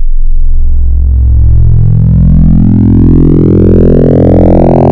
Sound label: Dist Sin. Fold, wavetable